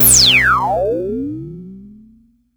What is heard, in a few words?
C1, Synth Hits; Zero-G; Soundclash Nu Dance Electro; Acidized